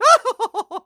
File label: LAUGH; The Wild Thornberrys Movie; DARWIN